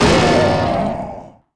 guitar

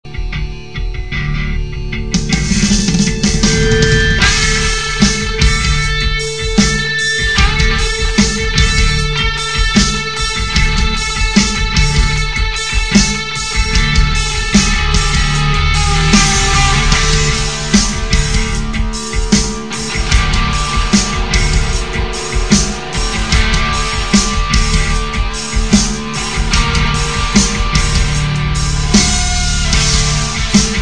loading

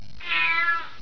cat

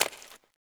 zombie ghost footstep; ghostfury

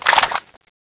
clip out, AK74; weapons

